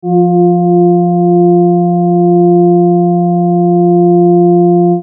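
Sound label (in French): accord octave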